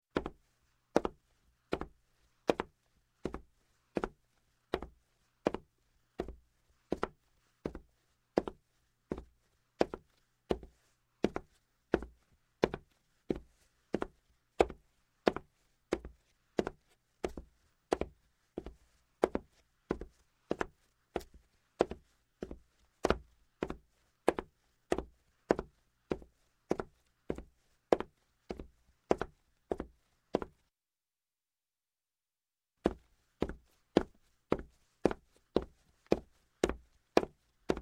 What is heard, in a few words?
Footsteps, Wood Boots, Slow; Sound Ideas 2000 Series